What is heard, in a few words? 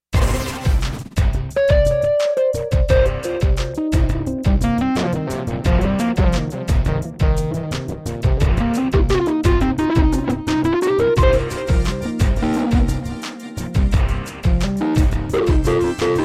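Roland JV1010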